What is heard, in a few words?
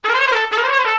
trumpet danger; music